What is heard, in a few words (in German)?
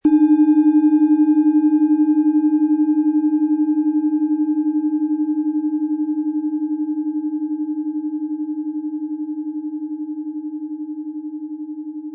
Planetenschale